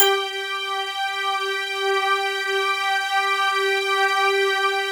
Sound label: PERCP, PERC PAD; Sound Gallery - Charged